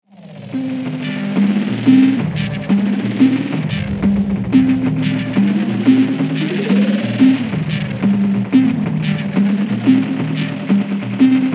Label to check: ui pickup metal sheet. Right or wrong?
Wrong